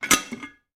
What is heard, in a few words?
household teapot lid off